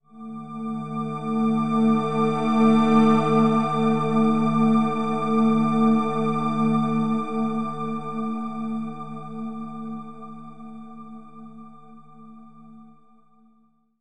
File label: Orchestral F